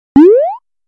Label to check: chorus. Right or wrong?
Wrong